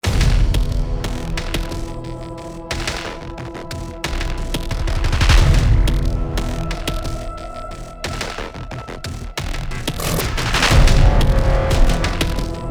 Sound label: music level music; rogue stormers